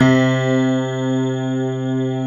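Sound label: grand piano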